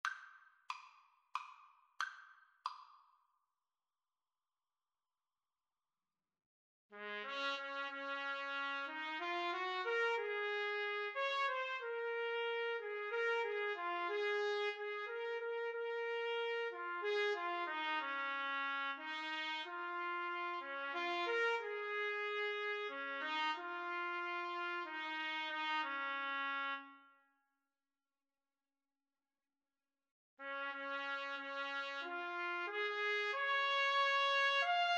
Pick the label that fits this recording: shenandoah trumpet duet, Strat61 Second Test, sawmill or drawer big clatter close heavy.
shenandoah trumpet duet